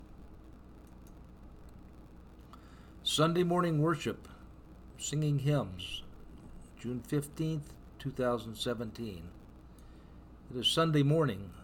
kids kids kids

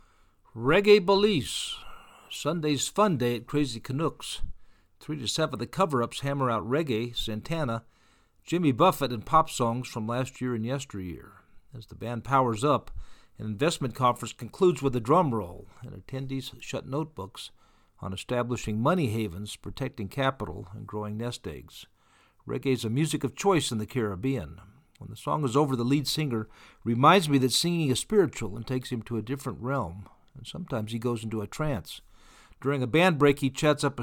reggae belize

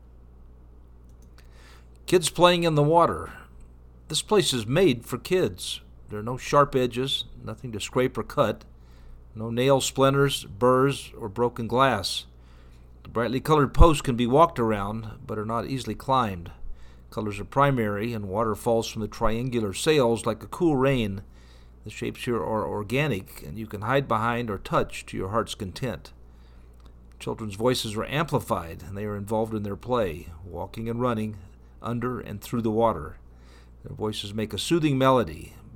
kids playing in water

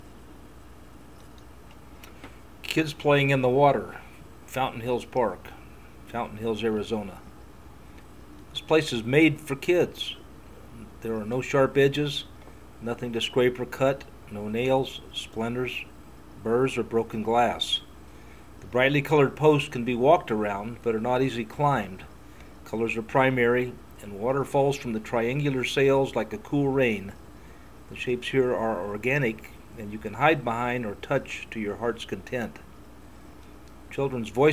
kids playing in water

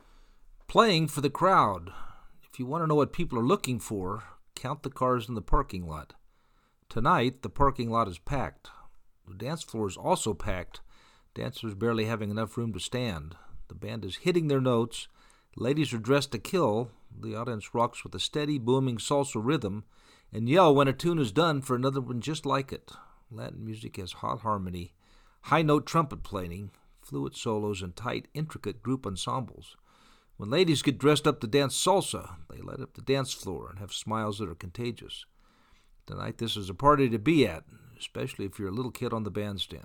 playing for the crowd

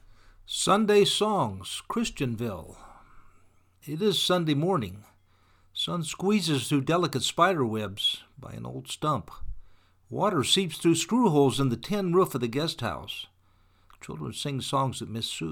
sunday songs christianville

